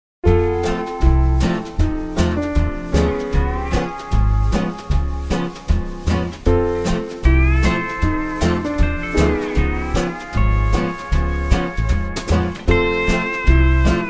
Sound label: Juke Joint Western Swing; Sample Library Tracks